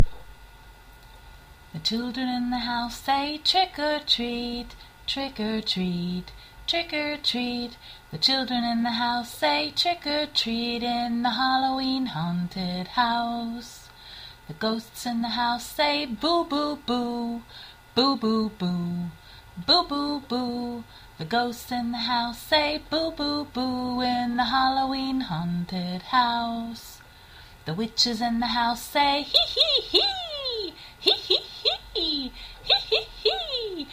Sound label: Halloween Haunted house